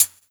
clear high hat sound d sharp key, Royality free hat tuned to the D# note. Loudest frequency: 9832Hz